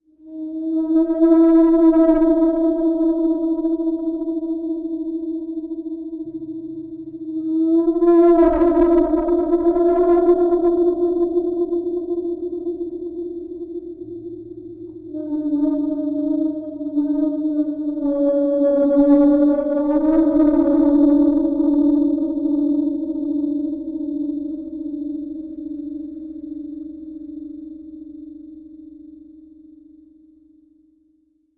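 designed stinger gong distorted riser stinger, cymbals; cymbals from hell vol 2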